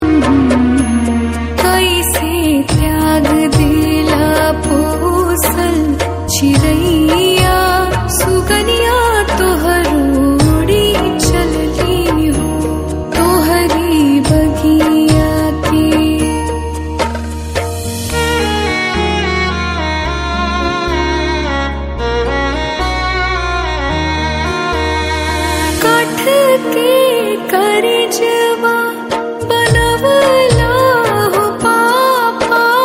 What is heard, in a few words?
Sad Song